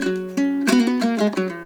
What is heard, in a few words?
HALAM MELODY; Best Service; World Colours